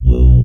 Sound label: Reverse Bass